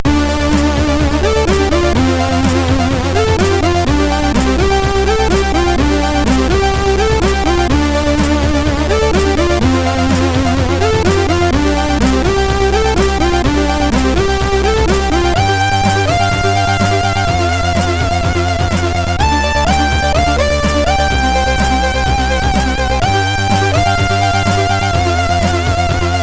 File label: Beat, MOS6581R3